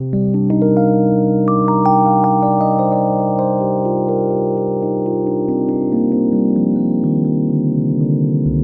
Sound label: chill rhodes broken Cm7; KEYS ORGAN PIANO